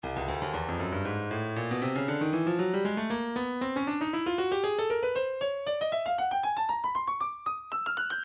breakbeat midi 117 bpm sizzle; Sizzle C; Stylus Breakbeat Midi Files

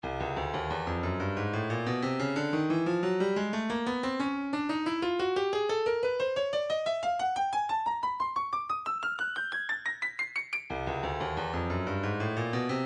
bongo, midi

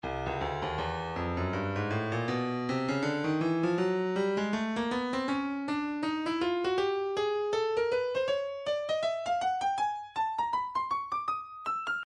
breakbeat 080 bpm; tnik c; Stylus Breakbeat Midi Files

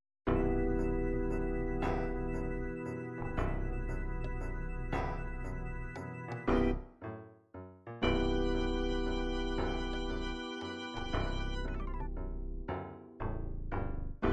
gospel gospel

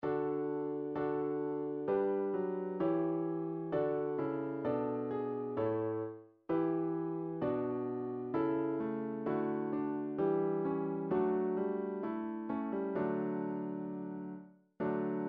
classical chorale

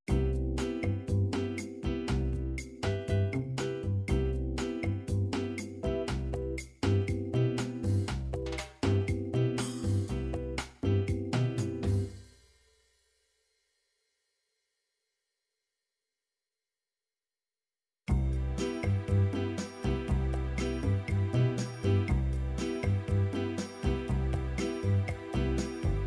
bossa, midi

